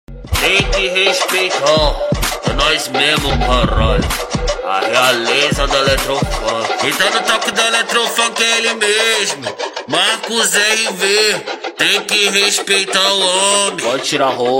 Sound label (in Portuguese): eletrofunk; eletrofunk 2022